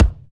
thumpy bass drum single hit b key, bass drum sound tuned to the B note; kick drum samples